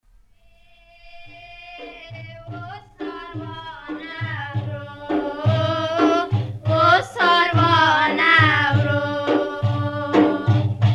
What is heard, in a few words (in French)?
Chant de femmes